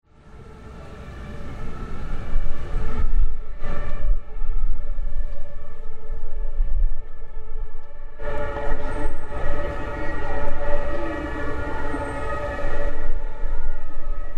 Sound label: 1 o'clock at Buergermeister-Smidt-Gedaechtniskirche